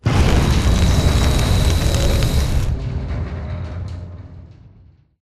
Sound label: Delivery Cage Slide Out; Titanfall 2; Boomtown